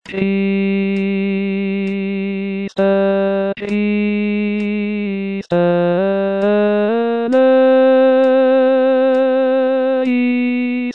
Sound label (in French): Kirie Christe Basse; Rossini